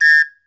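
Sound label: WHISTLE, LATIN PERCUSSION; Best Service Advanced Media Trax Modern Composer; LATIN PC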